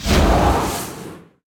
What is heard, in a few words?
Guardian spirit Attack